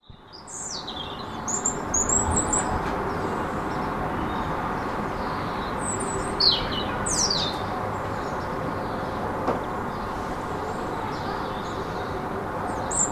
Rouge-gorge dans la ville

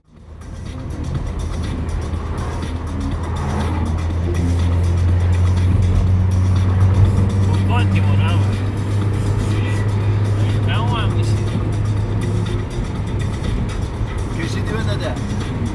voiture tekno fenêtres ouvertes

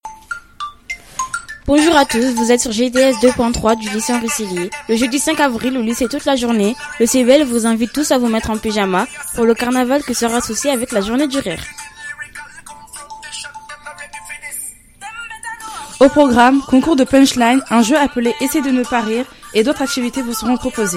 radio rire

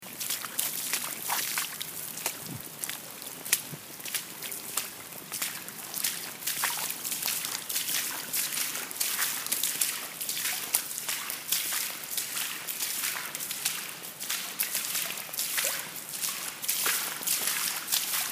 Pluie au chemin des abeilles

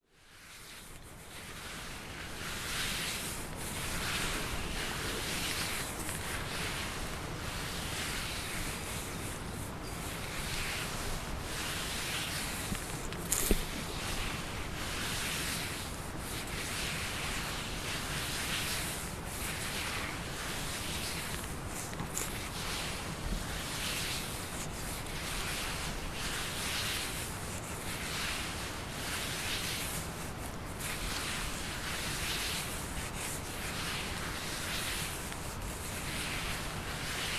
Tremble mousse

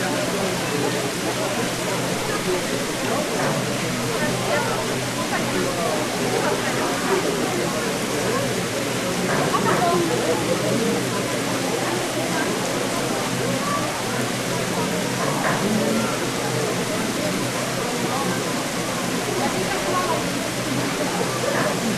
roue moulin sayat

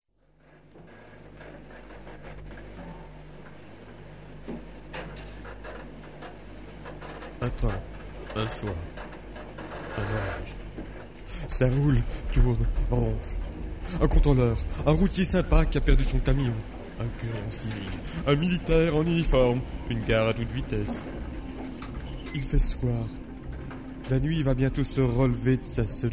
un soir un train

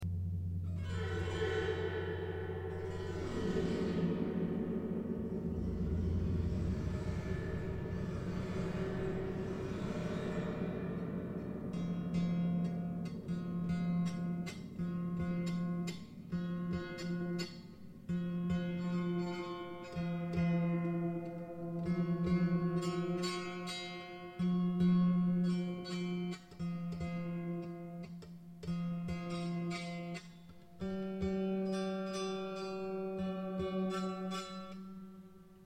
psalterion

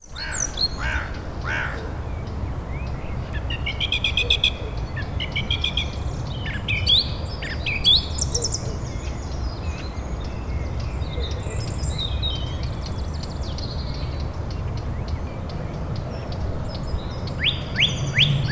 oiseaux

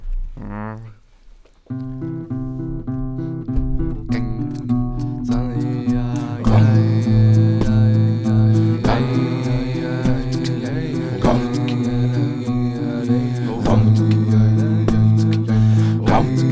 musique guitare

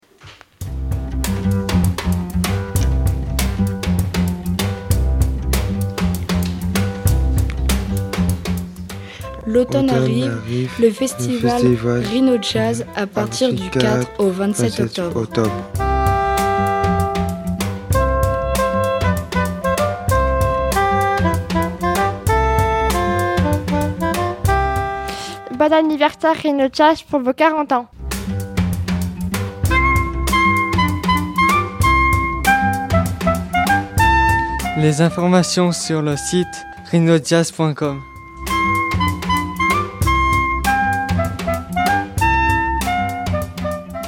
jingle; MJC Tilleuls; Rhino Jazz

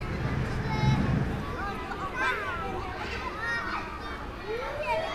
recre

